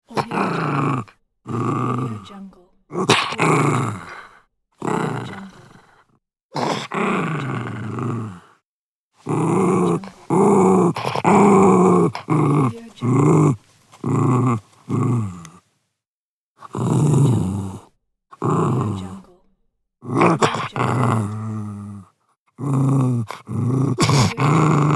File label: small dog growling